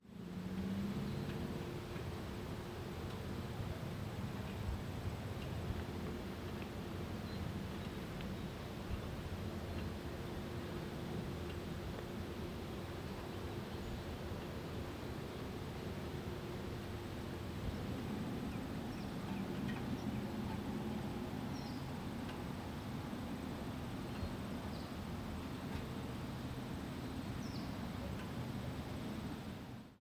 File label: City Outskirt Outdoor Ambience